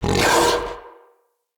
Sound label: Battle Dog Attack Bark; Animals; Vocals; Snc Battle Dog